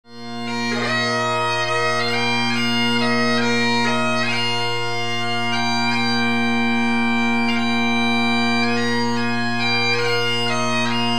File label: Bagpiper